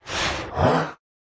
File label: Cave Dweller Inhale